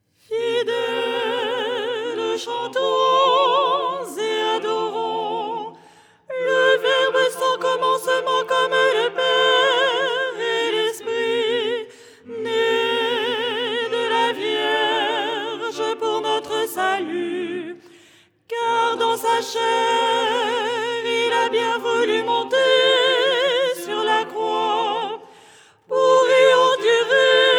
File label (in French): soprano; Tropaire du dimanche; Les 8 tons en français